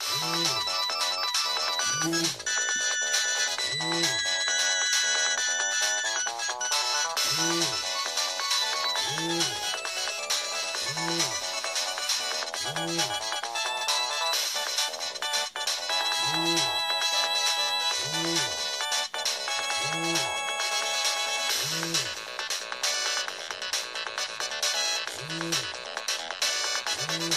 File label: hot funk ringtone, samsung sgh t100